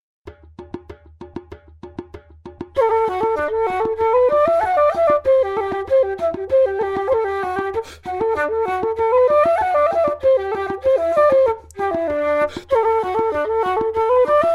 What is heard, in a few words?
flute percus; hollybush